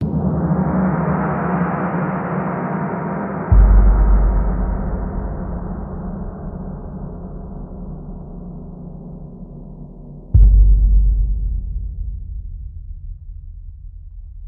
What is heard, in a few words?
Electronica